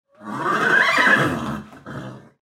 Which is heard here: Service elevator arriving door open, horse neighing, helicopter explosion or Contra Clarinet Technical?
horse neighing